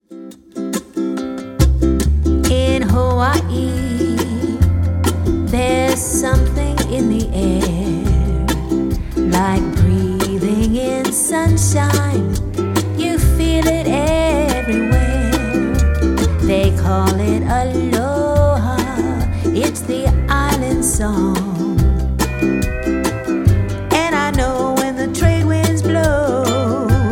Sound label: aloha